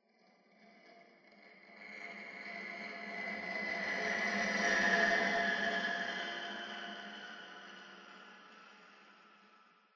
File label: Combat Crack